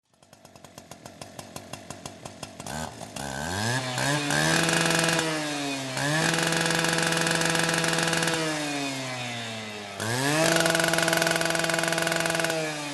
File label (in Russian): zvuki benzopily